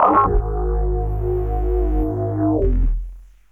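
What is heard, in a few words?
synth, 170 BPM, Drum & Bass; Ueberschall; Drum & Bass Flash Trackz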